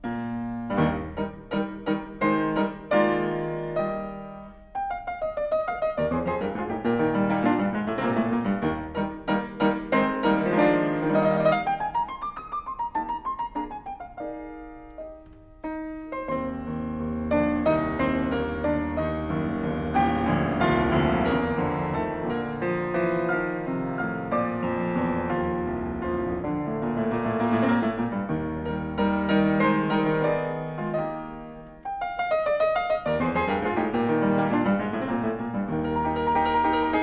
Six Variations in F Major for Piano, Op. 34 Var. IV. Tempo di Minuetto; Beethoven